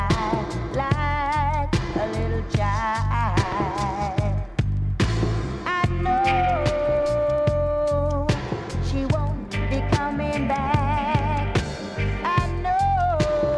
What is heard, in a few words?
Sandra (Drum & Bass)